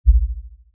LF Throb Short; noise creations

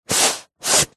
foley card box product pack slide open or close; zapsplat

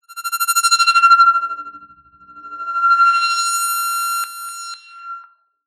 sound design stutter bright metallic synth; zapsplat

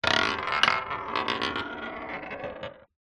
industrial metal ball bearing roll on wood

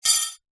cutlery tea spoons x2 drop into glass jar; household

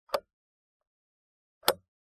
household lock metal turn click contact mic

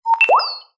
science fiction robot small beep chirp

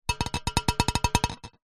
foley glass pane small vibrate taps on metal; zapsplat

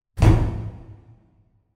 impact metal large reverberant industrial

